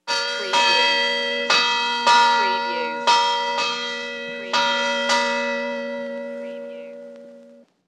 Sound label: Ship Bell 8 Rings Vintage